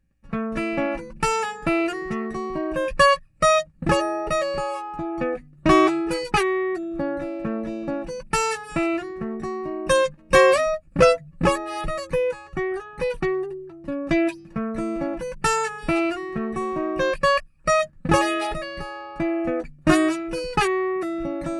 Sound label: HD28 Schoeps CMC64G